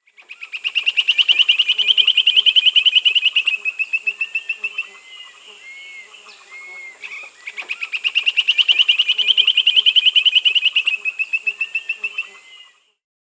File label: Point-tailed Palmcreeper (Berlepschia rikeri); Peru; S. America